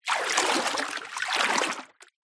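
nature water movement splash processed for stereo width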